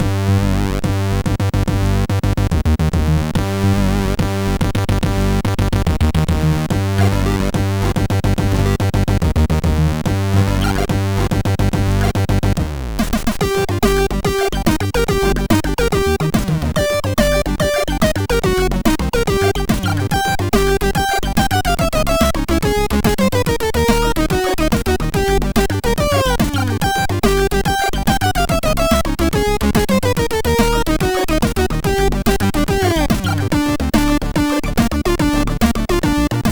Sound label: Upbeat, MOS6581R4